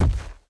hunter step; hl2 ep2; ambient, levels, outland

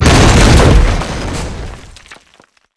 dog final fall; ambient; hl2 ep2; dog v strider

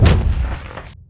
strider step; hl2 ep2

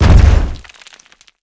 strider skew rock dirt; ambient; hl2 ep2; dog v strider

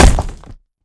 stalactite hit; hl2 ep2; ambient, levels, outland

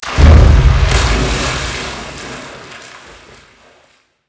shaft debris splash; hl2 ep2; ambient, levels, outland

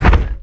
dog footstep walk; hl2 ep1; npc, dog

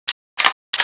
pistol reload; hl2 ep2; weapons

